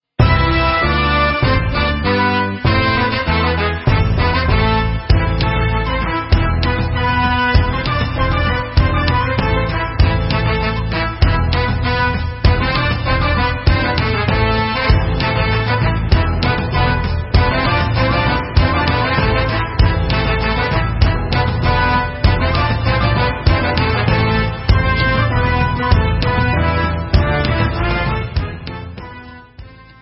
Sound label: Instrumental